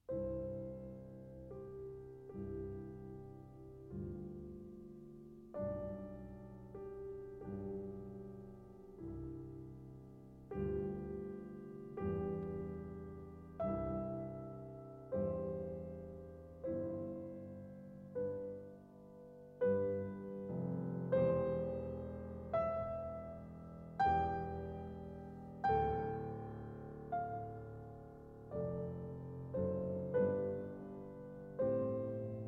Piano Sonata No. 32 in C Minor, Adagio Molto Semplice e Cantabile; Op. 111; 2. Arietta